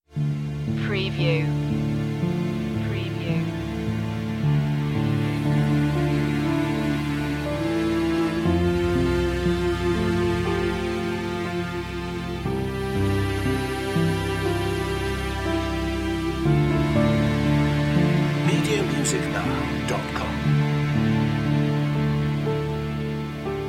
hymn

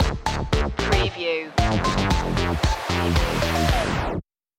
funhouse 84 stinger

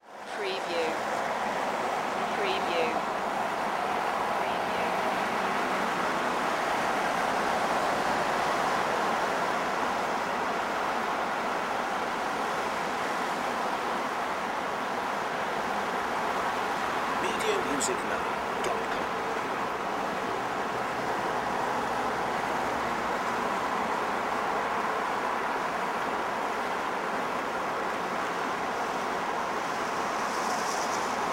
City Ambience - traffic from 3rd floor

